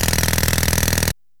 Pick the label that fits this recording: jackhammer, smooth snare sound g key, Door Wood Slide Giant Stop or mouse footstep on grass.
jackhammer